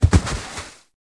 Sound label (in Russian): zvuk padeniya tela vybrosili iz mashyny; vvybrosili iz mashyny